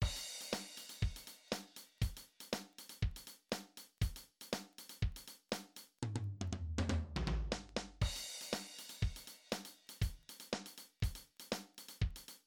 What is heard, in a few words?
Multiple Hands, drumset; Intermediate; Drumset Exercises